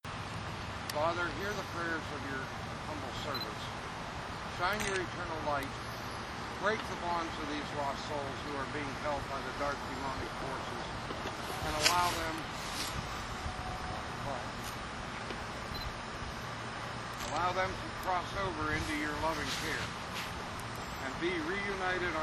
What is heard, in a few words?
children yelling